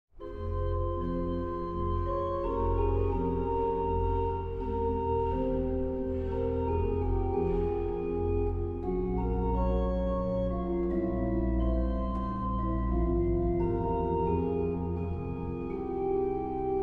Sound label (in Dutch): Sarabande (2 orgels en fluit); Psalm 65